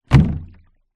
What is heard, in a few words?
industrial cannister small plastic liquid down hard ground; zapsplat